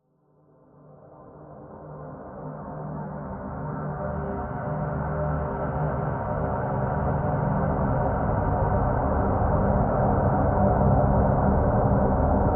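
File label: Drn Dimensional Simple C, drone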